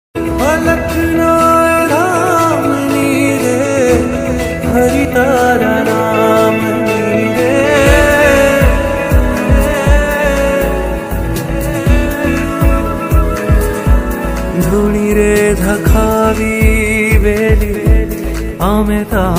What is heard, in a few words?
gujarati ringtone